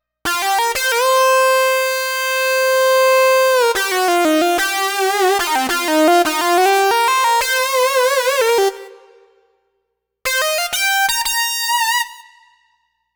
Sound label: Lead Synth Lick; Riffs & Solos; Dr. Fink's Funk Factory